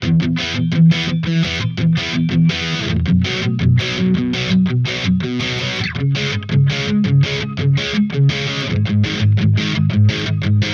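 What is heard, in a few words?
distorted bridge, guitar; Guild Surfliner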